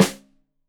Rim Shot Stereo, drums

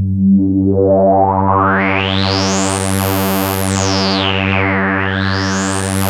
ARP 2600 G2, synthesizer; Hollywood Edge - Giorgio Moroder Rare Synthesizer Collection

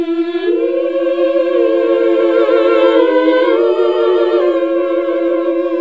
SING, CLASSIC VOX; Best Service; Hallelujah

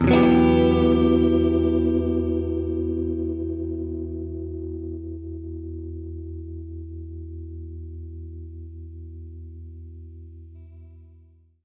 Gretsch Chord Emin[add9], Guitar Chords; undercover samples

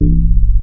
garage C2, garage bass; Best Service Dream Experience

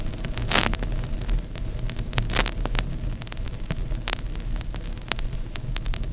Vinyl, noise

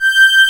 VO G5, ANA VOX, SYNTHESIZER; AKAI S6000 CD-ROM - Volume 1

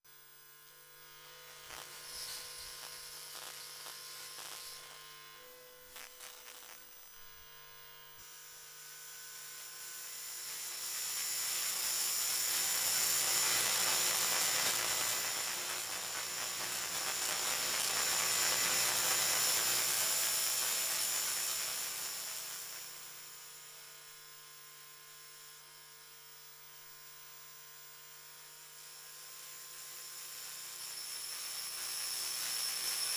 Electromagnetic Fields, Buzzin; electric fields

